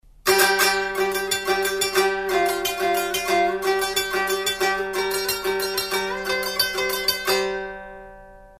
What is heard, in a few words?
amp